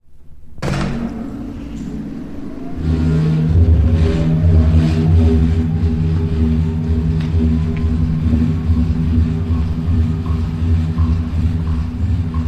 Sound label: Rocket engine; the mouse on the moon